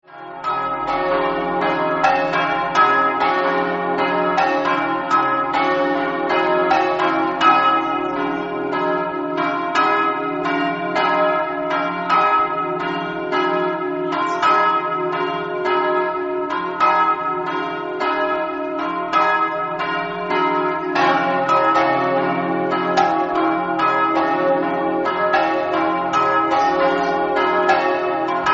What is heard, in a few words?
campane; mossa